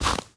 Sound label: walk snow